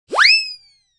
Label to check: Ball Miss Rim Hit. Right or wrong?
Wrong